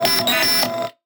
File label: interact; DEFENCEBOT